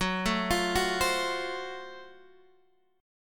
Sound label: Gb Minor Major 7th Flat 5th, Guitar Drop D; x,9,7,10,7,8; down; chord shape